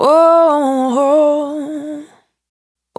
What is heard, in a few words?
Urban Dry, Single Voice Melodic Loops